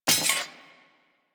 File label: Investigate Pickup Sword; Warframe; Cephalon Weave